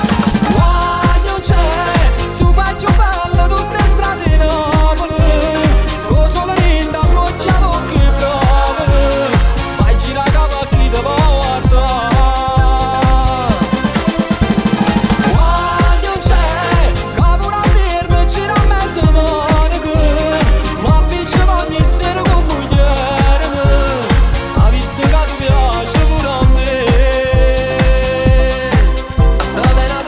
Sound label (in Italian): remix dance music cantanti napoletani